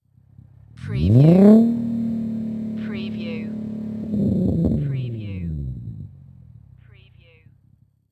INFINITY G35 EXHAUST LONG REV

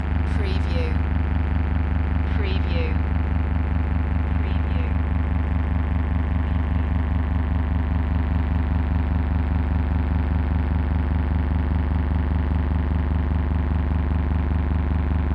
Electric Static Hum Pulse

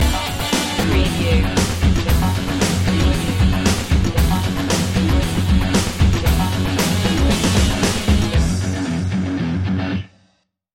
rock invictus stinger

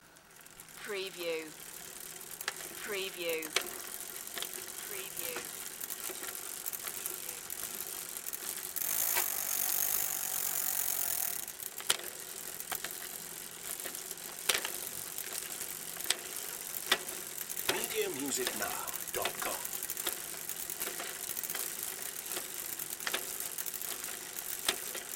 Bicycle cycling, close perspective

